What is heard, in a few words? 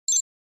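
Flash Beep Double Electronic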